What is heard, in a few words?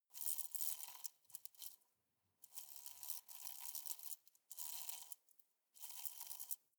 hornet hum glass aggressive long